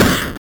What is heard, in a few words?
Gun Shot 8 Bit